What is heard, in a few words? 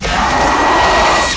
pain; quake2; insect